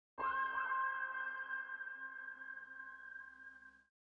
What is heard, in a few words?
161 BPM G Maj Accent Synth; Cymatics; Destiny Gold Expansion Stems; Hidden Hills